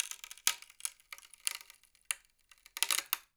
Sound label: Palette Tear, Wooden Palette